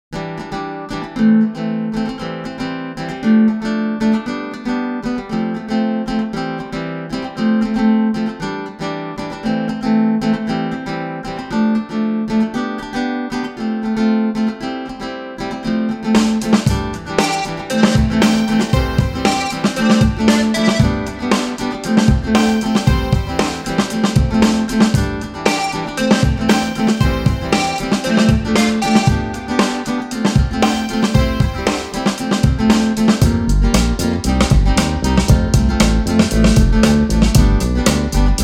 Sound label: inst